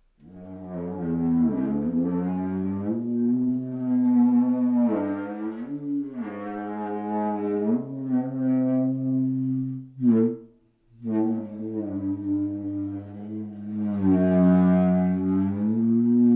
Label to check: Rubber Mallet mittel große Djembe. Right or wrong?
Right